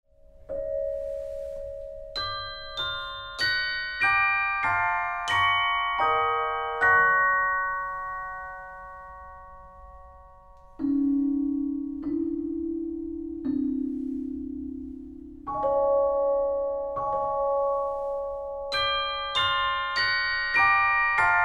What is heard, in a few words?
Solo for Celesta; Rosenkavalier Motiv